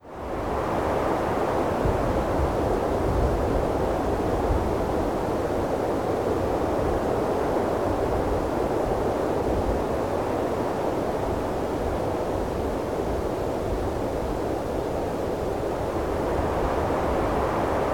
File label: Cities Wind